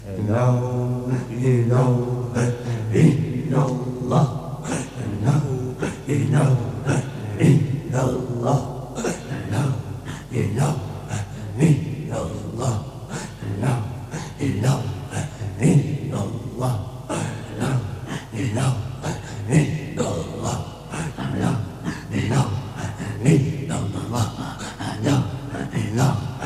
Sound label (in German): Musik Sufi Atmen